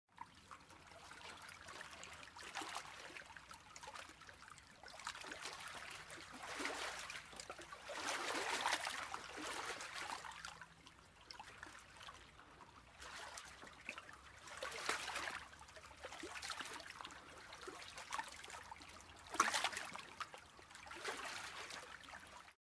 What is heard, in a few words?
Boat Water; Age of Empires III; cinematics